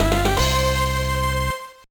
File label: Jingle; Super Dodge Ball Advance; GBA